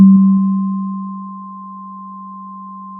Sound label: Sound of a church bell